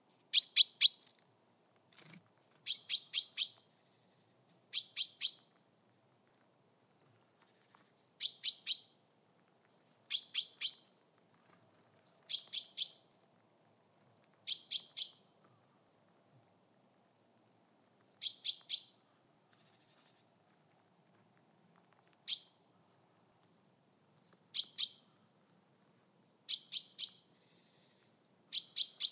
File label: gelatik wingko betina call